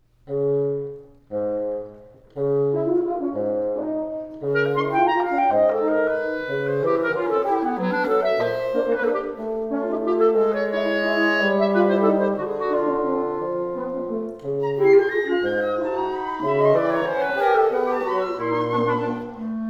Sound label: kleine kammermusik walzer